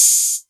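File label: 808 Hat Open; Novation DrumstationV1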